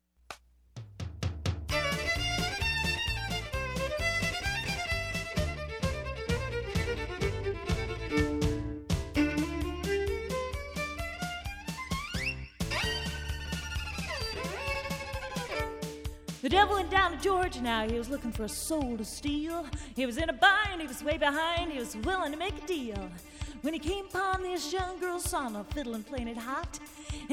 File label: solo